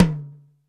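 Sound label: JAZZ tom; JAZZ KITS; Crypton; DrumBase5000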